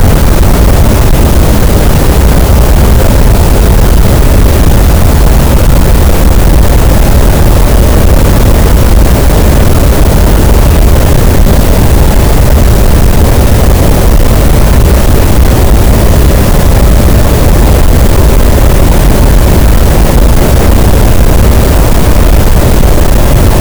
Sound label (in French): cachalot, Physeter Macrocephalus, gaussian noise